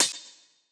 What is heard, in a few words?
Hihat; Ghosthack Advent Calendar Day 4